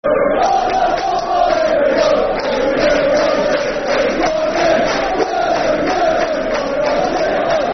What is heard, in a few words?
Football Chant (Soccer Songs); E I E I E I O; Leicester City Lcfc